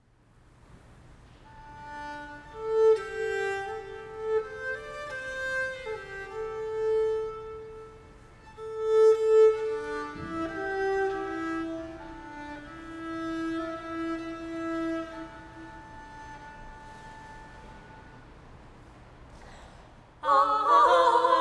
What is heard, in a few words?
Ave Maris Stella